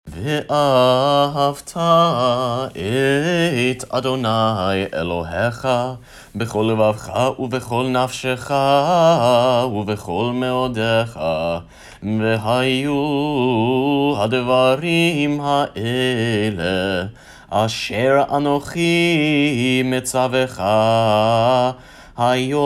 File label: Vahavta Chanted